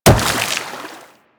Wall Jump Water; Footsteps; Warframe; Tenno; Wall Run

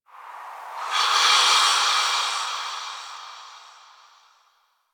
Whisper Air Draft; Warframe; Quests; TheNewWar; Ambience; Zariman

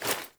Foley Action Default Punch, Default Clothing; Characters; Player

